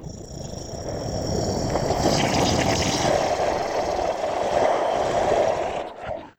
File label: Beast Growl Long